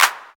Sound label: short clap sound g key; single hits one shots